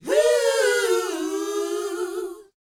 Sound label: LET, Vocal, R&B Dance, DANCE 120